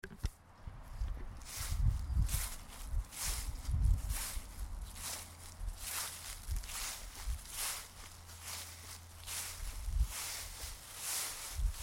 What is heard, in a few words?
walking in leaves